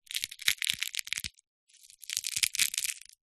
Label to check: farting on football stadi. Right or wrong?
Wrong